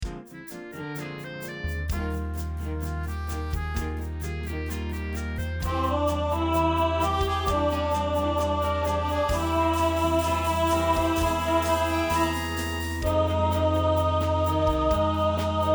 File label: Alto 2nd Part